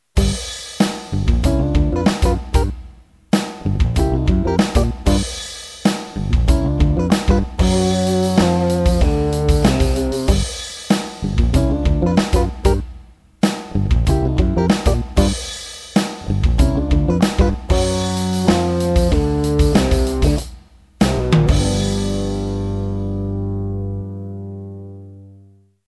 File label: jingle